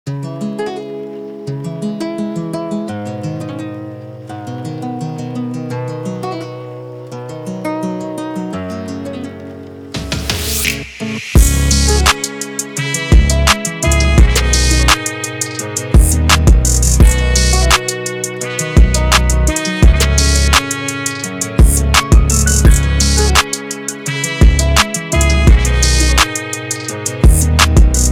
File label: Emotional Trap